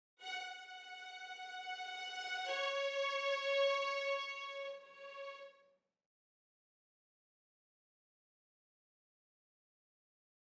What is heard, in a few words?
Violins